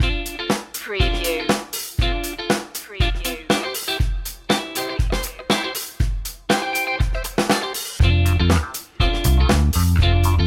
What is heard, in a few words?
funky time, rhythm and blues